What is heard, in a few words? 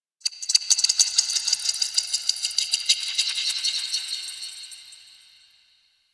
Sentient Texture Small, Ambience